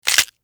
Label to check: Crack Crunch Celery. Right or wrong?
Right